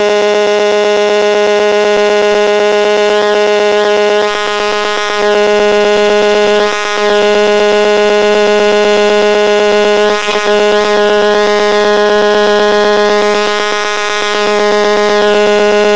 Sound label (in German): technisches ferics